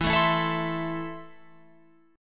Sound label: E Suspended 4th Sharp 5th, Guitar Open F; Esus4#5; strummed